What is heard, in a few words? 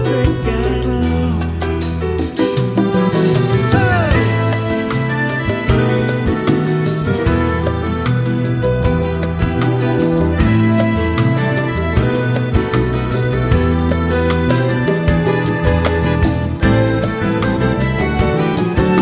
samba; Latin